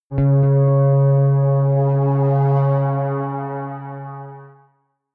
synth bounce

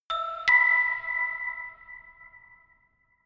notification glockenspiel creepy fifth up

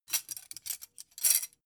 dough hook grab long; industrie-wirtschaft; gastronomie

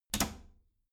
bathroom cabinet doors close regular; objekte; tueren

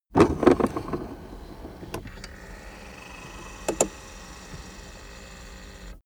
car accessories center console display extend

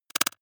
noise clicks; maschinen; signale; soundpool

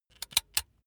razor extend comb hard; maschinen; rasierer

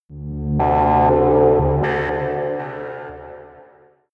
sci-fi cosmic energy; horror

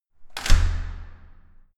entrance door close hard

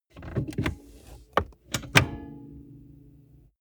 car bmw e46 interior seat adjustment down

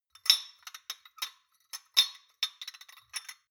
shot glasses handle, gastronomie; berufe; soundpool

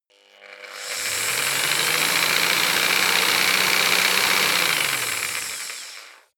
jigsaw engine slow long; maschinen; saege

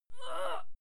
female fight scream pain muted; schreie; menschen

